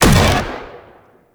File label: Laser Disc Moa Fire; Warframe; Venus